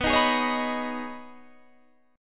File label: B 6th Add 9th B Guitar DADGAD, chord